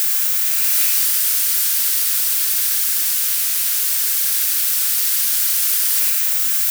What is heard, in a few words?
ambience disaster hiss